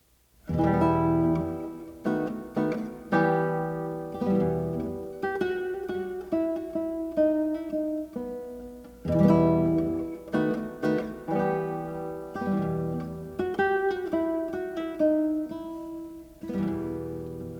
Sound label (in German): suite für gitarre solo nr. 2 e moll gavotte